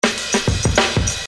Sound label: Beat, Hip-Hop Street Beats